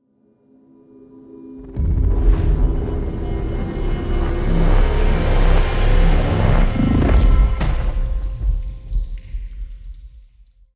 main To Jackpot; mercy of the gods

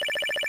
total win countup; reel rush

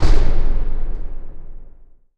freespin Counter; shangrila

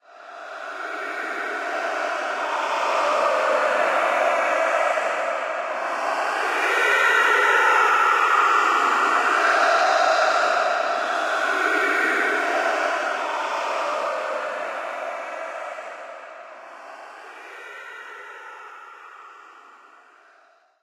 crowd Chant Right, football

